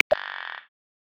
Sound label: Tree Hyrax; Xiaomi Redmi-Note-8-Pro; Africa